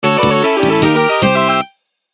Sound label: Level Start